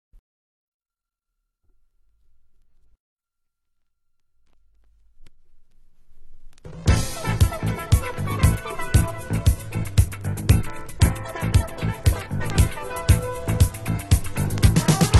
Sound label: french boogie